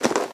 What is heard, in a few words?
armor light; smite; chiron